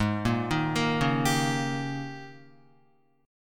Ab Major 7th Suspended 2nd Suspended 4th Ab, down, Guitar; arpeggiated; JGuitar